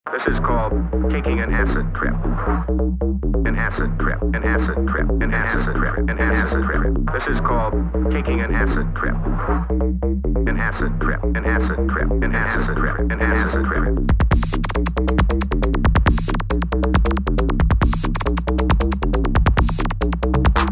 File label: acid trip soft; electro